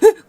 ooff; Kiryu Coco

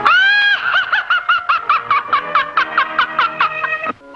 Laugh, Worms speechbanks; Felix el Gato

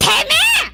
ouch; Usada Pekora

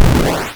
explosion

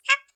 hop

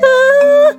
ooff; Kiryu Coco

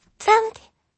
plant; ld52 growin ballistic

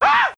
Ooff, Worms speechbanks; Stick Figures on Crack

